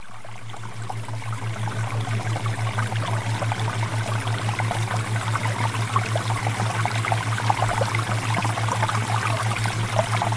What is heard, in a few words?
Water Theta Waves; Premium Subliminals; Pro Gamer